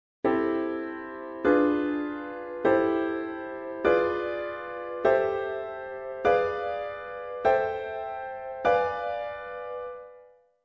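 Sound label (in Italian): scala armonizzata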